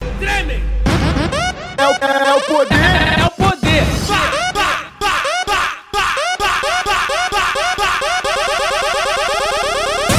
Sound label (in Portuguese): funk gospel